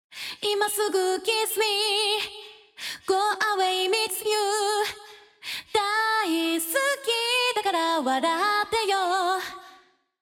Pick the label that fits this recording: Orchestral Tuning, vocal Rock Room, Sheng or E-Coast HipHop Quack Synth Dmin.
vocal Rock Room